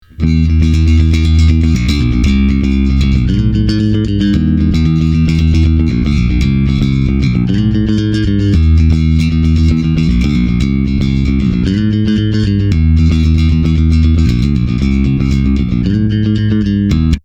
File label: clean bass